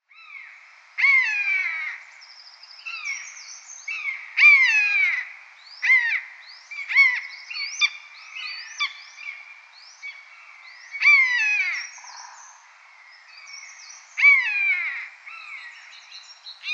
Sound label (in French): Buse variable